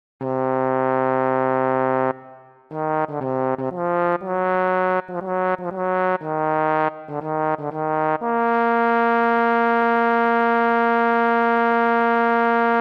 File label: Bass, Barbershop